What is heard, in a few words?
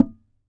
SAZ TAP; Spectrasonics; Hans Zimmer Guitars VOL-2